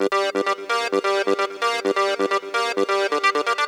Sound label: sequencer; Loops; Electronic